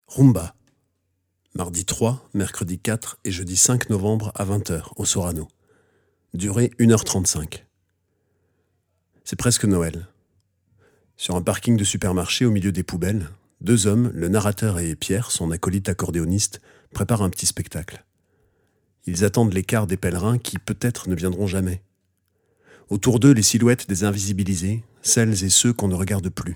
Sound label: rumba